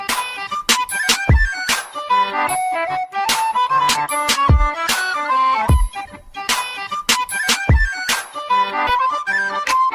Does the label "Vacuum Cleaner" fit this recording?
No